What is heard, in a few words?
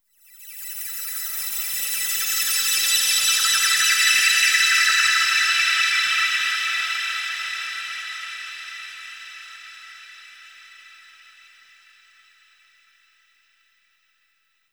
Gridnode Overlay Tone